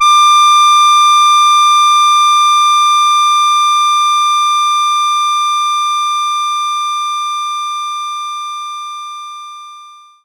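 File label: Accordian A sharp 4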